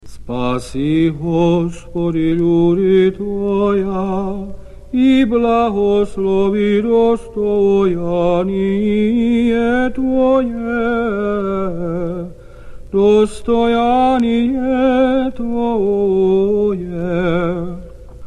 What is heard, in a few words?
Tone 6 Prokimen; Papp Irmologion; Liturgiji